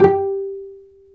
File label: STR VC, Vcs Pz dry; Roland LCDP13 String Sections; STR Vcs Pz.1 dry